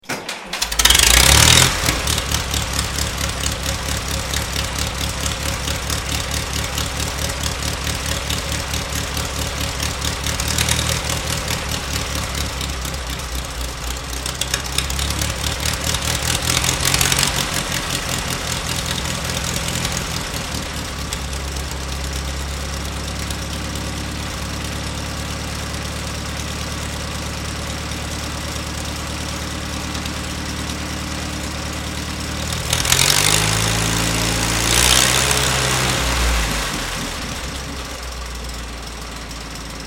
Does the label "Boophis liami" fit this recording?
No